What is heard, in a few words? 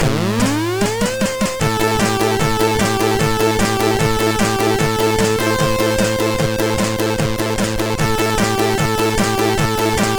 Nantcos Disco; sid MOS6581R3